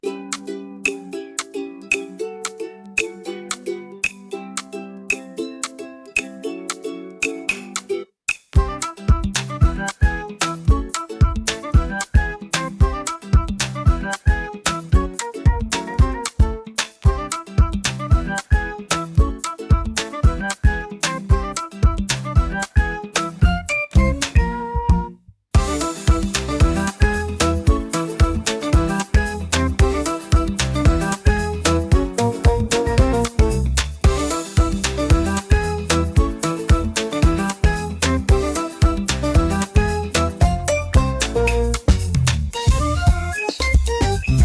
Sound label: dopamine ukulele